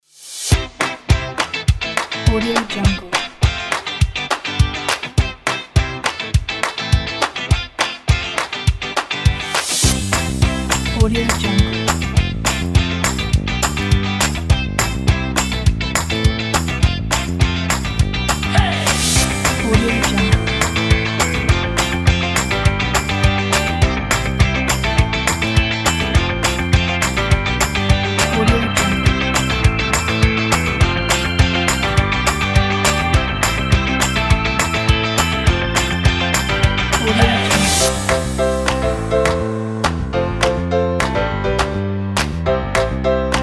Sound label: party fun indie rock